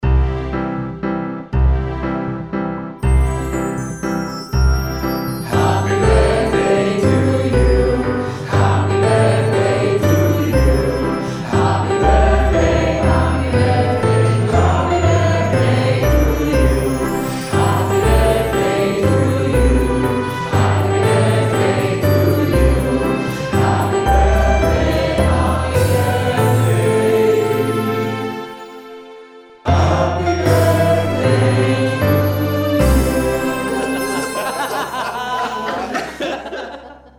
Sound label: Happy Birthday Song cheerful; karaoke; Singing Bell